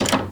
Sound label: Door Handle Jiggle